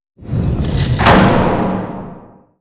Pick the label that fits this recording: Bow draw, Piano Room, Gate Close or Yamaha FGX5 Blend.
Gate Close